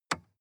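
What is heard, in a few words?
sports car interior lightswitch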